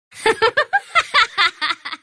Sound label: laugh